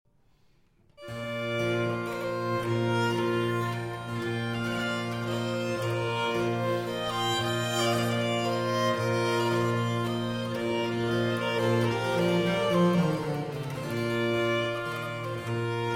Concerto For Hurdy-Gurdy, 2 Violins and Basso Continuo in C Major, Air; II. Airs